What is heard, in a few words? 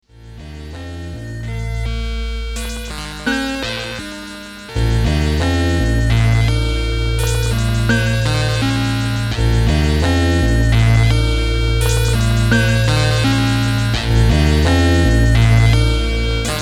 Solo